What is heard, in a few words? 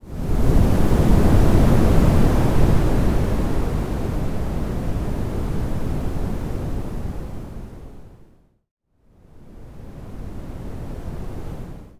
wind mountain